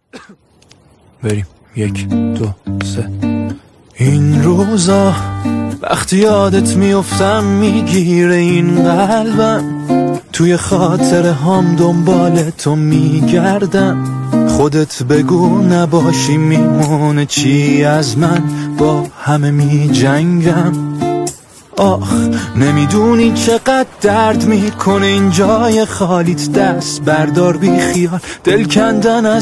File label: guitar